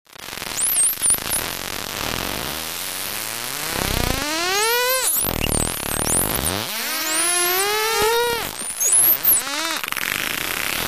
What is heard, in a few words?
sonar